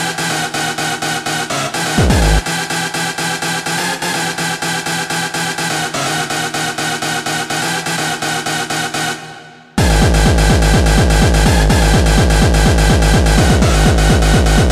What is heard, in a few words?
Bleed 250 Bpm